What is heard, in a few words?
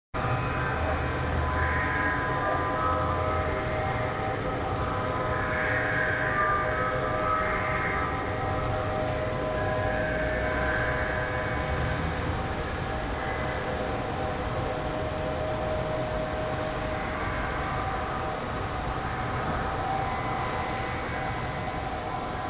Night Sounds